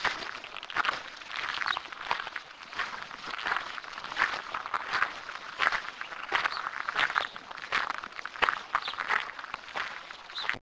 criss pas gravier

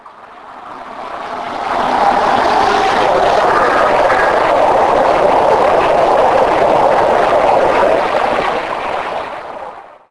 avion

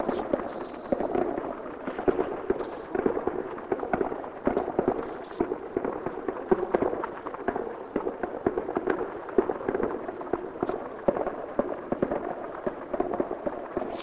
feux artif

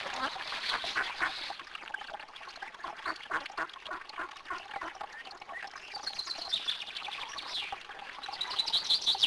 canard + oiso

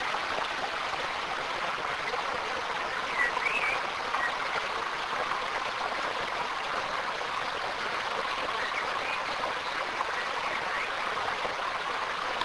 cascade

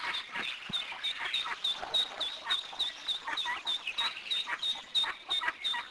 caneton; caneton 001

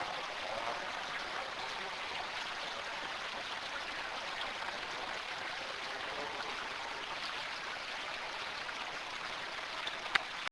temps pluie

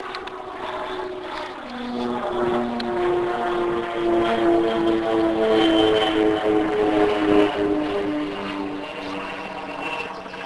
avion

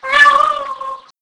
paon

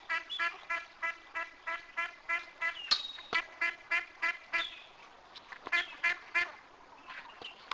canard

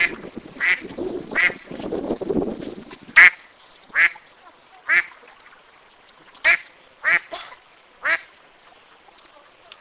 canard

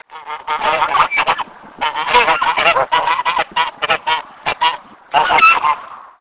oie; oie 001

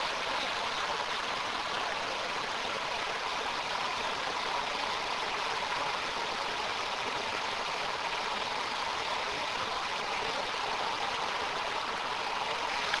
torrent

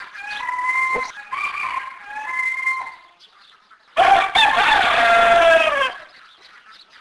coq

